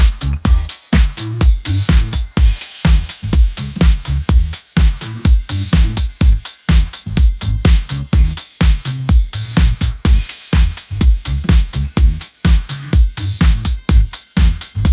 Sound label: dancefloor fever groove, ringtones; ai